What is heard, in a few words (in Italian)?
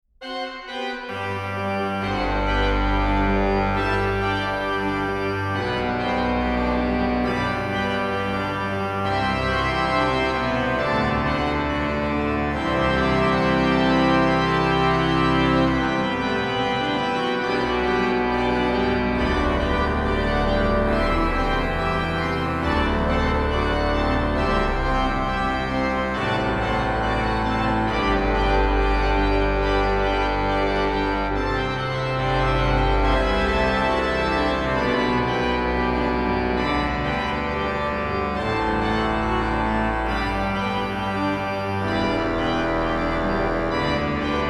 fanfara, organist; Carlotta Ferrari; gelobet seist du